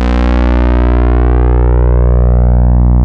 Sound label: TAURUS C3, synthesizer; Hollywood Edge - Giorgio Moroder Rare Synthesizer Collection